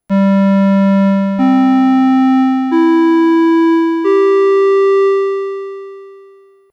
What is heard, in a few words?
chime; Bosch; Chimes and Announcements